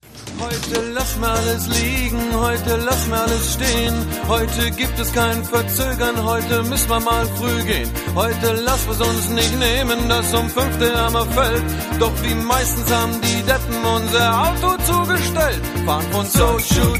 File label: Samba; Seoul